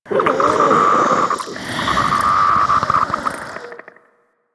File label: Zombie Breathe; Warframe; Infested; Breathing